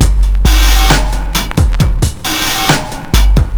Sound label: 67 bpm, breaks; Slomo; Zero-G Beneath the Planet of the Breaks